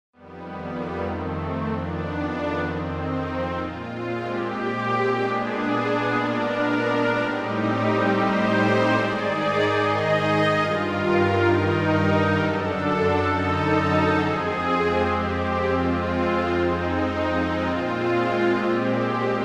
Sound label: ensem